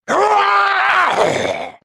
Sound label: zombie